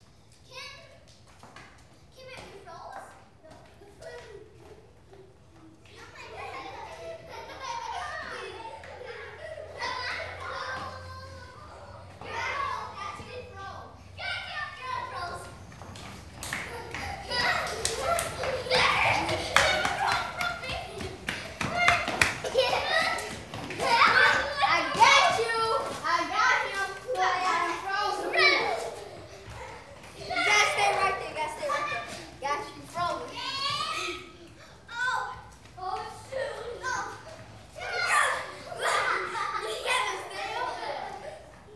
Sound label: AMB EXT Apartment Courtyard Children Playing; GriffinSound - Places With People